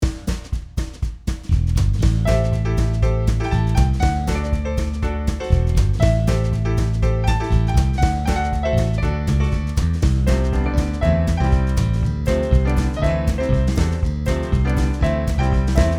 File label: piano theme